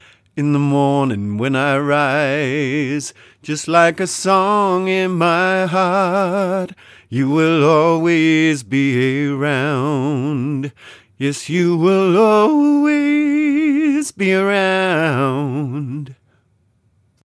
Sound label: Ballad Male Dry; se electronics z5600a ii